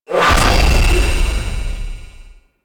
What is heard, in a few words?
Lair Gravity Stream Stop; Warframe; Sentient Lair